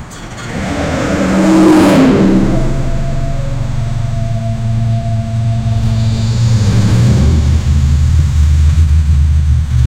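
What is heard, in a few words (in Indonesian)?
suara konvoi besar kendaraan; japan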